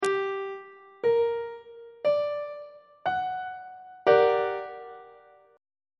f double sharp minor major 7th chord on treble clef